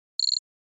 Flash Readout Multi H

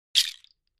Shaker Bracelet Natu, percussion shaker

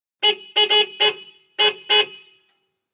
Montero Horn Beeps, 1998 mitsubishi montero ext.

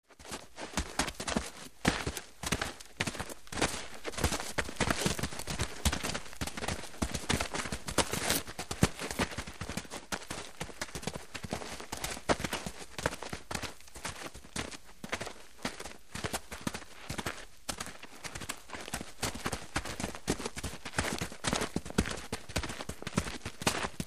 Footsteps Group Sol

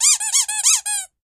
Rubber Toy Squeaks, toy squeak

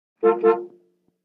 60 Plym Int Car Horn

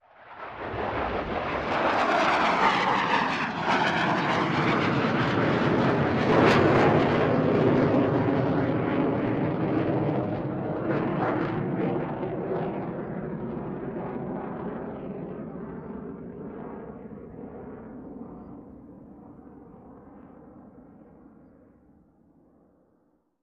F/A-18 flyby

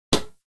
Badminton Soft Shut

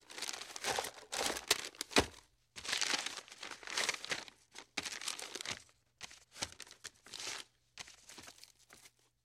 Parchment Paper Hand

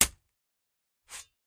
Shovel Stab Dirt

